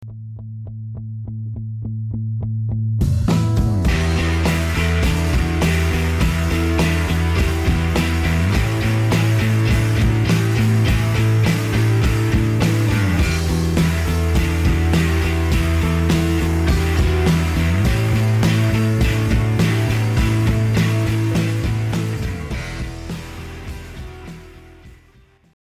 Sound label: test music